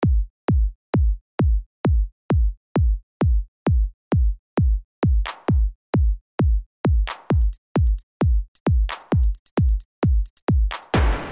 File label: Loop, fruity loops